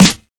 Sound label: 00s Treble-Heavy Snare Sound C# Key, Royality free snare drum tuned to the C# note